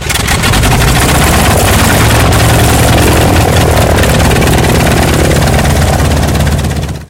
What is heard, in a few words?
engine start, engines; War Thunder